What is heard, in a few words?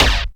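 Ind Snare; Quasimidi Rave-o-lution 309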